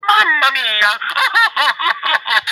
mama mia crying

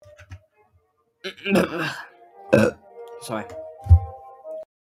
burp

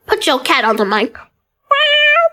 cat

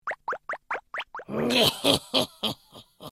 tubee laughing

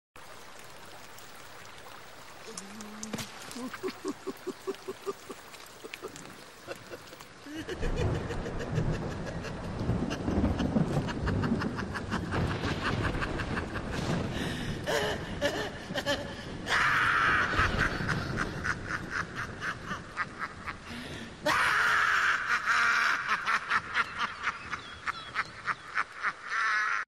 crazy laugh; joker

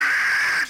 screaming

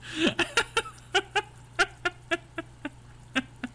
laughing

